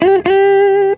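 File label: GTR, guitar; USB Soundscan vol.32; Roots Disco; AKAI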